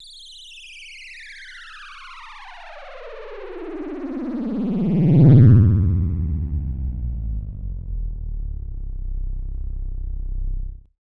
FAT SWEEP, SYNTHETIC; AKAI Anime Sound FX

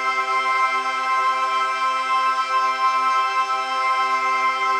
Pad Loop C, shimmer and sparkle; Pad Loops

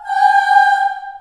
G4 child AH, choir; CHILD AHS

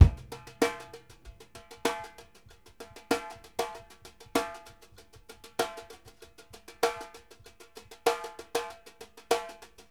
JUST SNARE, BRUSH; Sampleheads - New York City Drumworks VOL-1

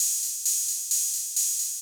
Beat Open Hats, 132bpm; Construction Kits; Big Fish Audio - Old Skool House; OSH Belgium Beats

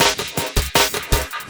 LOOP SD, 160 BPM; Ueberschall; Drum & Bass Flash Trackz

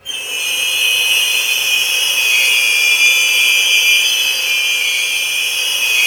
STR FX, HORROR; East West - Global Sounds

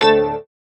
chords

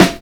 LAIDBACK SN, HIPHOP; Drums In Your FACE!

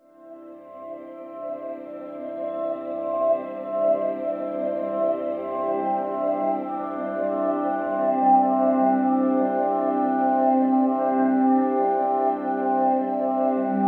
Guitar Textures

